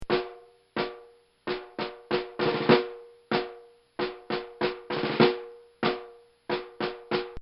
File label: Military Drum Roll; Military Sound Effects